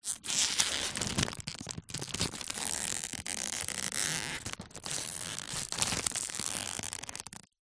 foley packet plastic crisps multipack empty squeezing handling; zapsplat